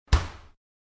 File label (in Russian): zahlopn dver holod